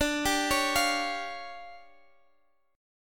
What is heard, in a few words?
D Minor Major 7th Double Flat 5th, D, Guitar Half Step Down; DmM7bb5